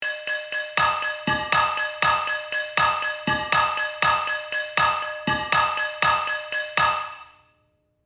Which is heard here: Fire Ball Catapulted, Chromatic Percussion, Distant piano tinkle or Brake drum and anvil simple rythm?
Brake drum and anvil simple rythm